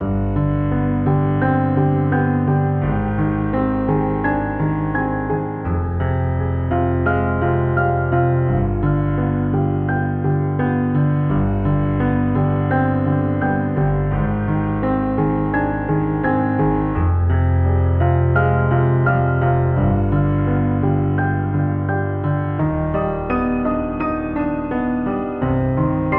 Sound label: Keys Darkness; Keys Music Pack Vol. 2